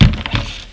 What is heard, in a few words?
door; Little Red Riding Hood